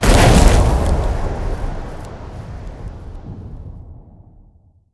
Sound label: shout frostbreath